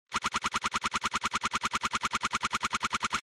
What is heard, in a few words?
SNES Action Message